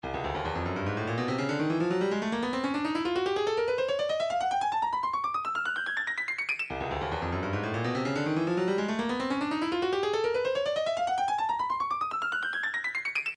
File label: 144 doumbek, midi